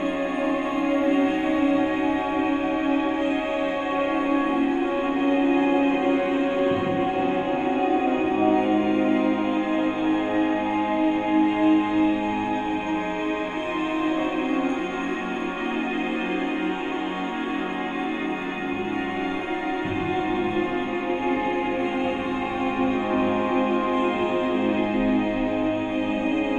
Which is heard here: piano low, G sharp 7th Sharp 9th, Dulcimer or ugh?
Dulcimer